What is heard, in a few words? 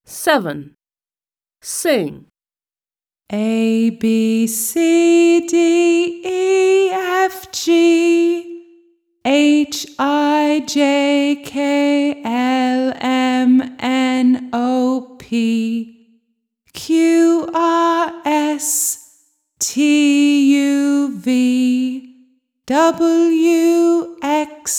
American song ABCDEFGHIJKLMNOPQRSTUVWX; My alphabet book; Smart Book 1